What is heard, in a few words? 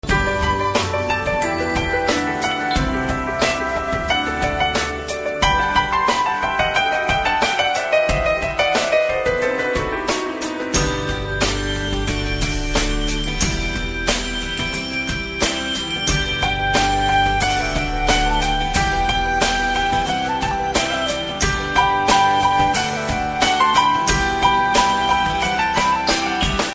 BGM Piano